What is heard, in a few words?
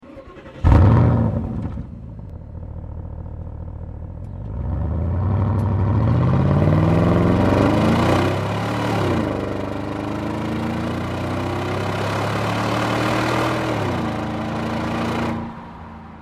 Porsche Cayenne Turbo engine